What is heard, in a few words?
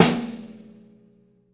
rich lows snare drum b key; snare drum samples